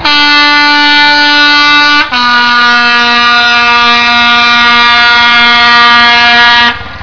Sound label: horn